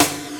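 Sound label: flange snare drum, FM drums; East West; Global Sounds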